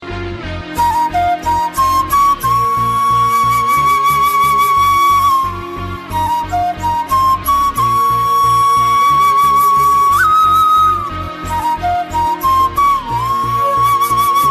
flute ringtone

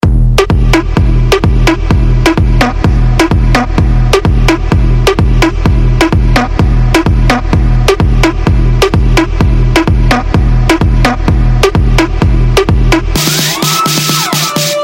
hip hop electronica rock music tone; iphone

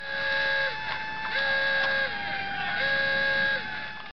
sirena ringtone; commandos

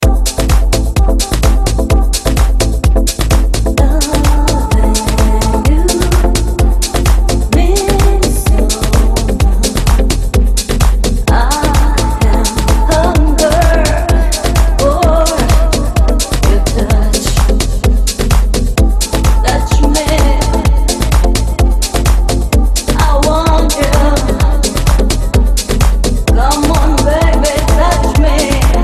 house vocal ringtone

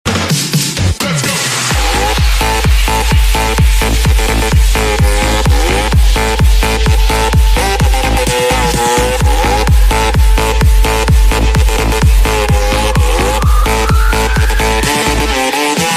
random disco ringtone

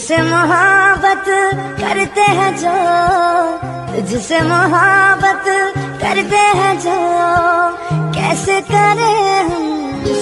romantic ringtone, bollywood

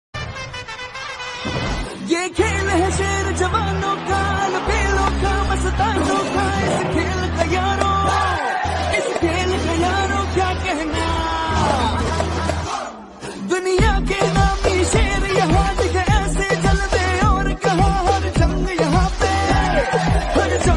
anthem song tone ringtone; ipl; bollywood

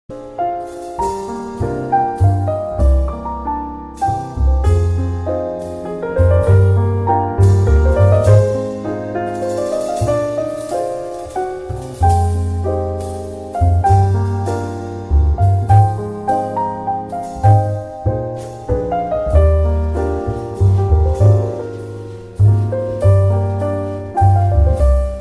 romantic ringtone

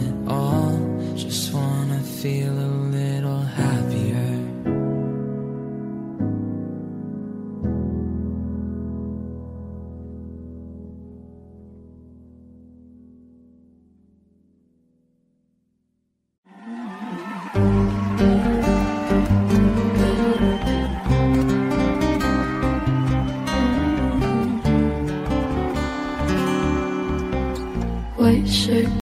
english music ringtone

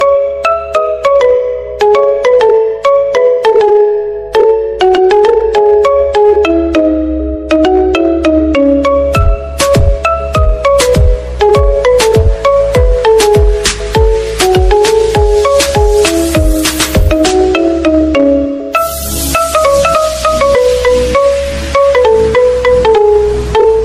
english fast sweet ringtone

